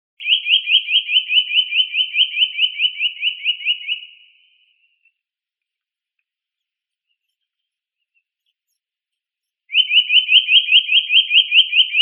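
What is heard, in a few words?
Eurasian nuthatch, Sitta europaea; popolzen obiknovennii; zvuki prirodi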